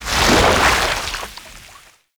Aura Ink Explosion; Gameplay; Warframe; Shadowgrapher

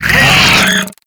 Desert Skate Vocal Pain; Warframe

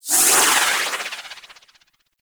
Void Angel Corruption Healing Proj; Abilities; Warframe; Zariman

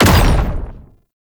Perfect Heavy Attack Impact; Warframe; Melee

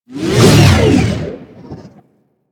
Fighter Ship Close Short Fly By; Space Fighter Crp Ship Engine A

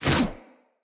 Axe Whoosh; Weapons; Enemy; Axes; Glades Axe

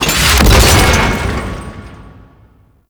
Pipe Damaged Fall; Ambience; Gameplay; Warframe; Corpus Railjack; Comet Shredder

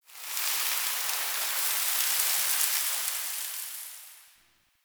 Sand Fall One Off; Ambience; Warframe; Man In The Wall

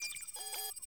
Messenger Map Hover; Warframe; Ambience; Nineteen Ninety Nine

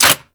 Rifle Mech; Warframe; Infested; Quanta

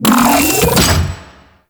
Shell Pillar Spinning Ball Activate; Warframe; Corpus; Railjack; Comet Shredder

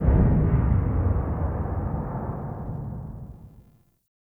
Big Hit; Ambience; Warframe; Nokko Colony

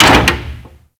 Mover Metal Clunk; Ambience; Props; Warframe; Orokin Derelict

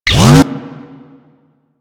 Osprey Shield Funnel Start

